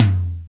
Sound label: f1, KORG DDD-1 drum computer; Retro Sampler